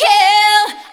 vocal

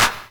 Clap Short

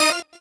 normal hit normal

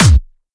normal hit finish

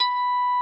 N.H.

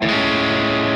Arp. Amaj7 Arpeggio down

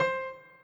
piano intro

